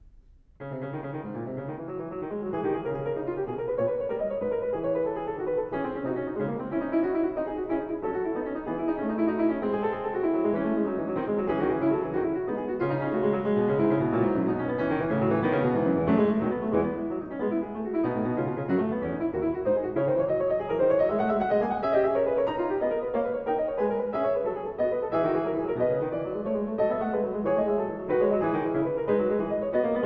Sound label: Prelude And Fugue No 4 In C-Sharp Minor BWV 873 Fuga, Well-Tempered Clavier; Johann Sebastian Bach